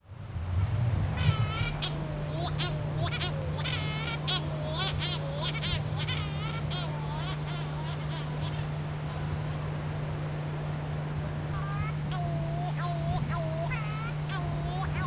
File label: Cory's Shearwater, calls